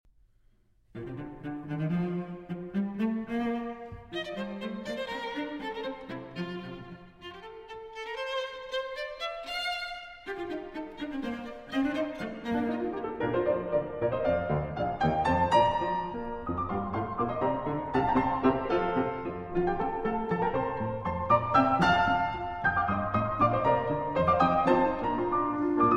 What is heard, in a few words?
Piano Trio in B-Flat Major, Scherzo. Allegro; Op. 97; Archduke